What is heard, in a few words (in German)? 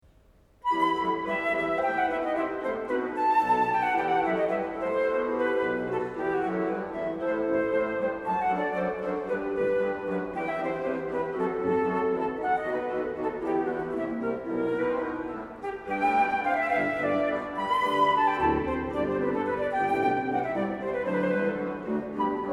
Sonate für Flöte und Orgel in e-moll Allegro